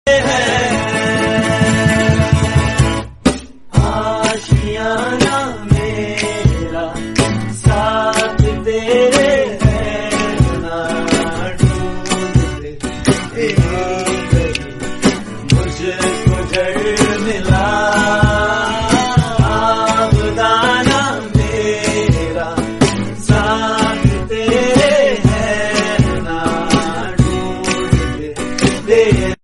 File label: hindi song